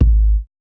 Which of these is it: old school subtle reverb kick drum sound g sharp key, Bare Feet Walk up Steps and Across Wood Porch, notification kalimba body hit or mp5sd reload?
old school subtle reverb kick drum sound g sharp key